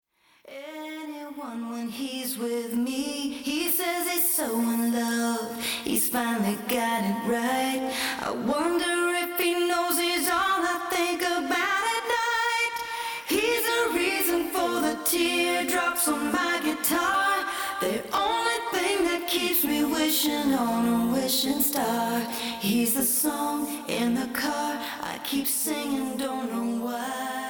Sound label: guitar cover